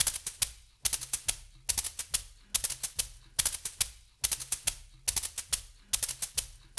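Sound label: 140 bpm, subway tracks; Spectrasonics; Supreme Beats; African Contemporary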